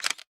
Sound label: shutter mirror up; LG Aristo 2 PLUS X212TAL